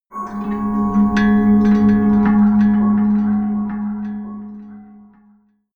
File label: melodic odd pad bell low